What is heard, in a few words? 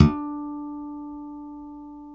D3 HARM, STIK BASS; D-STIK BASS2